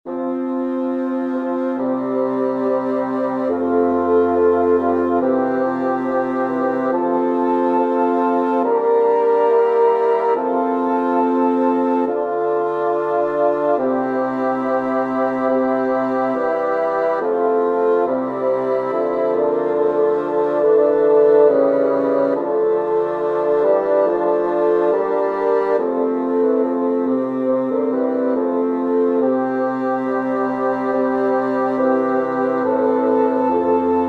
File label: for bassoon quartet